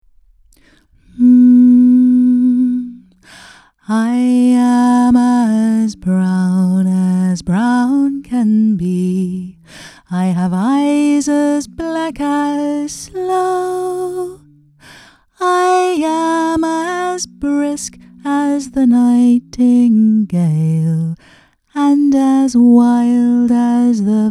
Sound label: LEAD VOCAL; EFDSS; The Brown Girl